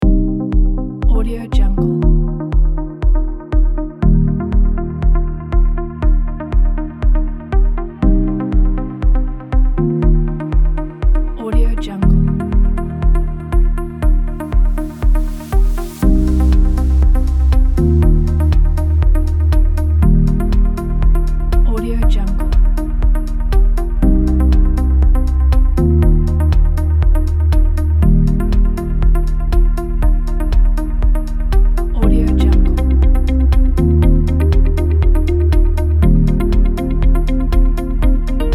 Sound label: ambient electronic minimal